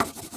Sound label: Photo Crayon Env Scatter